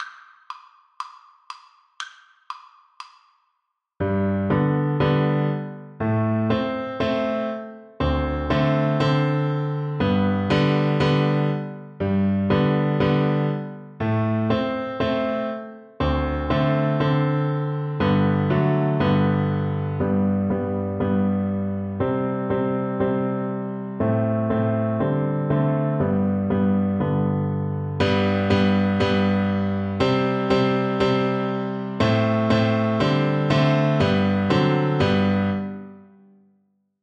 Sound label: Double Bass